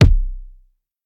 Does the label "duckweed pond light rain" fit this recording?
No